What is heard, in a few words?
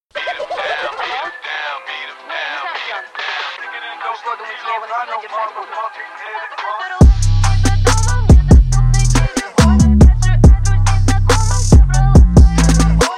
phonk remix